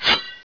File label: Knife Attack